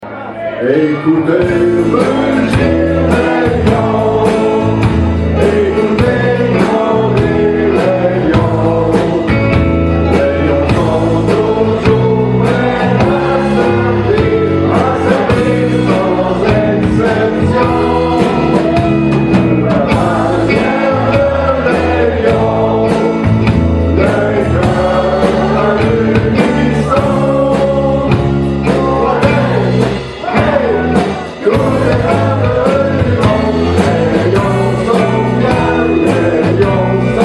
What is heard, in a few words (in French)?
Chant Lions; St Romuald